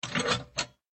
Open Safe Door

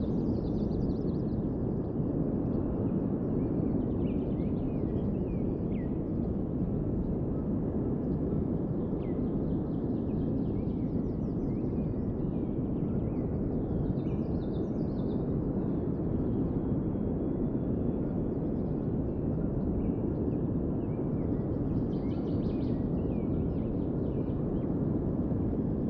amb potluck indoor

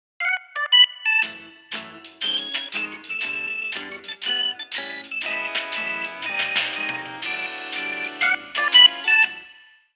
Radio Jingle (Evening); Animal Crossing New Horizons

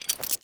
Grapple Holster

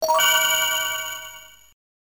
COIN; Chase H.Q.; Taito Z System; arcade

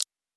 item clicked

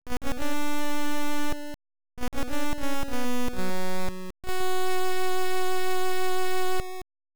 Get Conch Horn; Link's Awakening DX

